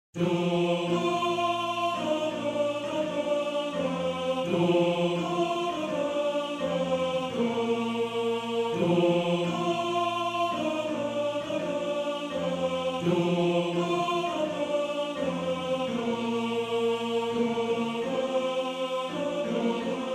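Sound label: La haut A Tenor 1, Männerchor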